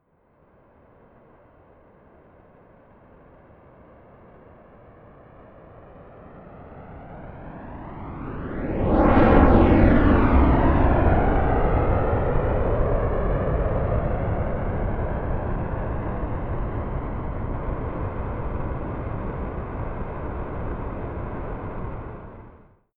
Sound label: military jet rafale flyby left to right fast distant